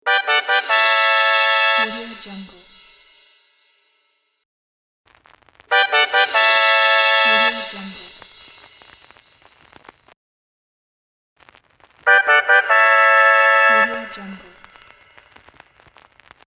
level complete brass pack

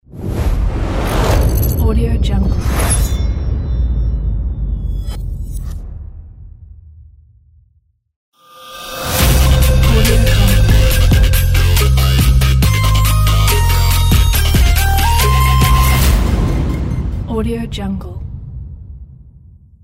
logo pack; total logo pack

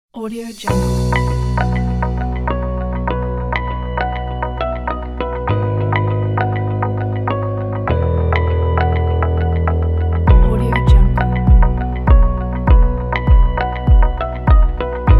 ambient music

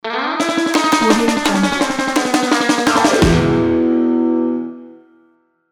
surf rock intro logo

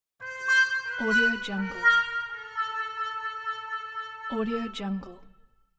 trumpet fail; moonaudioproduction

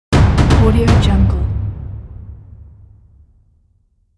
deep drum impacts

